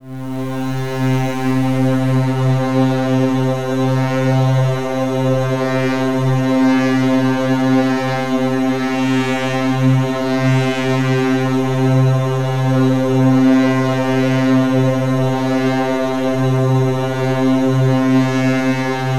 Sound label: Atmosphere C